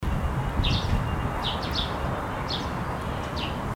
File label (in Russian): zvuki ptic